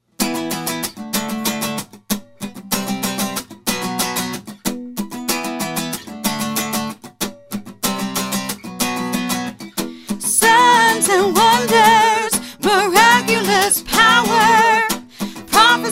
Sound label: signs wonders soprano